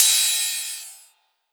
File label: Rides, Cymbals